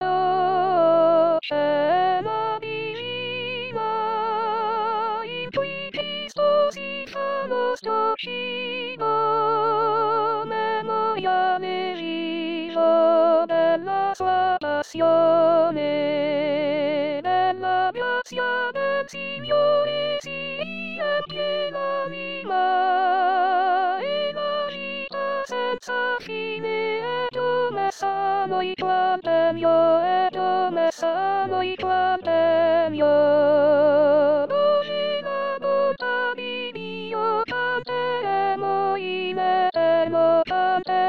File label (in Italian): O Cena Divina Soprani